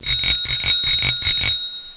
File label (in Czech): KOLO